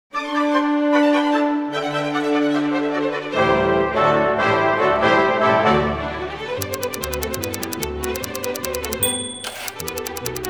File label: The Typewriter